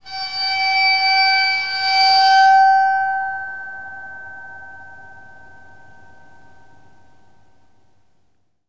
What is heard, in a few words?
WATERPHONE A; ILIO; Synclavier Percussion 2; World & Orchestral